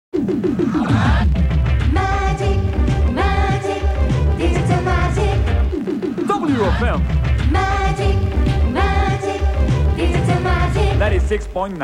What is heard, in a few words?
Jingle, radio